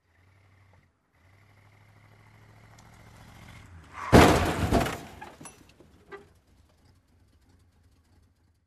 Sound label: Approaching Car Crash